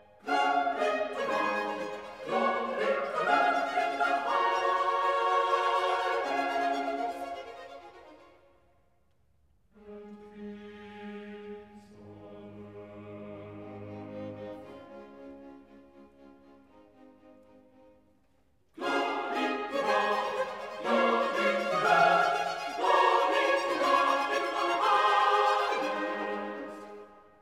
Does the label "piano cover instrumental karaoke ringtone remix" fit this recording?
No